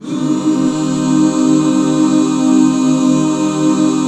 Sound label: C MIN, Back Chords; Sound & Vision - Gigapack II CD 1